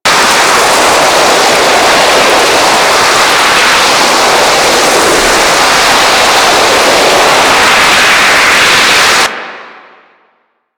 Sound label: Ocean Geyser Erupt Loop; Ambience; Warframe; Grineer Ocean